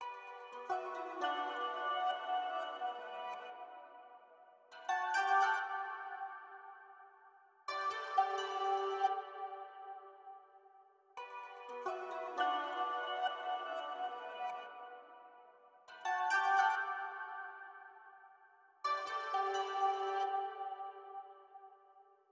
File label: 172 BPM B Min Harp Topline; RnB; Melody; Loop Stems; Cymatics; 2022 Melody Collection; Houston Dancing